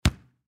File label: Volleyball Hit; Miscellaneous Sports; SoundEffects-Blastwave